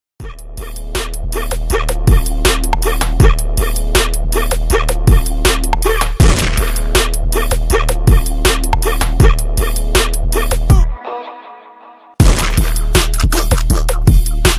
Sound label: redrum 80 bpm